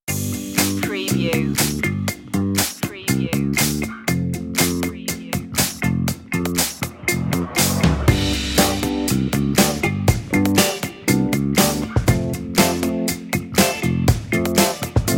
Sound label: easy funky